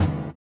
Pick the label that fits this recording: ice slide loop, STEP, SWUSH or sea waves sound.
SWUSH